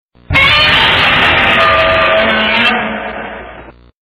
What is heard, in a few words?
Godzilla Roar Sms